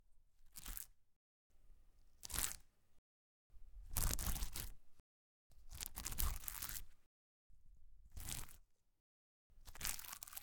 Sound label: Tearing Flesh Slime